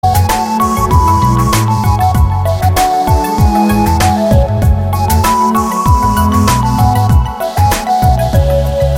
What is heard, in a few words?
Love Bgm